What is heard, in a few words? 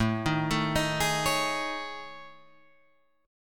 A Major 7th Flat 5th, down